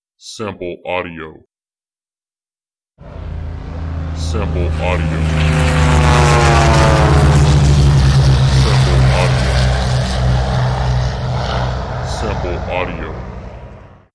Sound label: Squadron Flyby